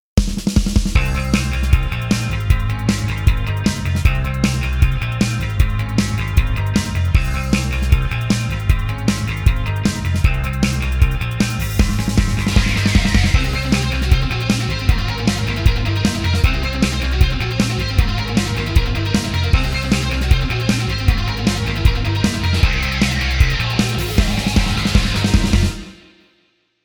Rock Mix DRY

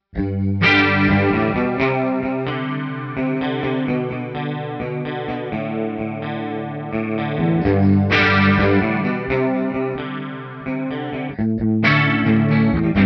Rock TwinR Chorus, guitar; Rock – TwinR – C2 Chorus; ReTube Custom Shop

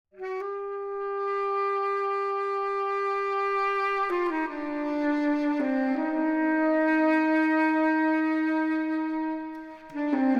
Sax Medium; Sax medium room; Simply a plate reverb.; Verbtone

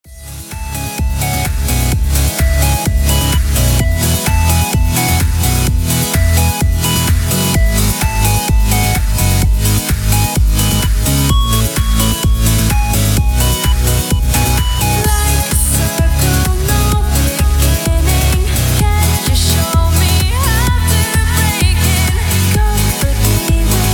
Mastering Electronic Wet; SDC

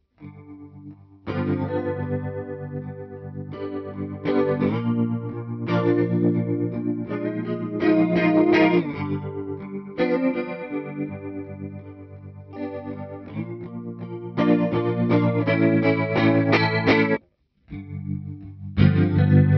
chorus Tremolo; C2 chorus; rock tone; virtual amplifiers

